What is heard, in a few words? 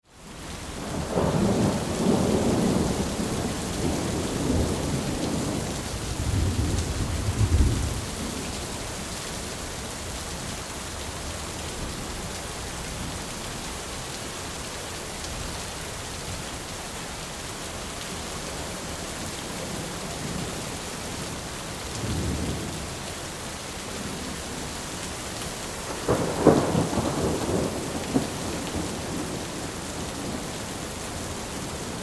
Thunder Storm Rain; Weather; Thunder Sound Effects; Blastwave